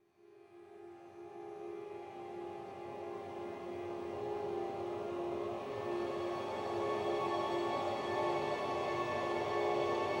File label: Explosion; Street Fighter V; Story